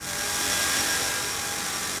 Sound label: Radiowaves Selector; Dark Textures